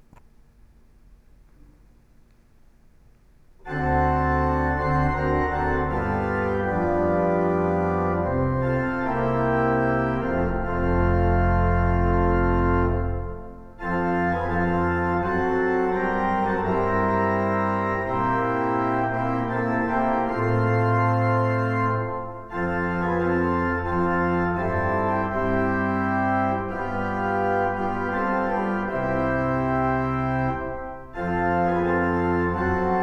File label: Organ Performance; alumni